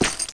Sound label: player swamp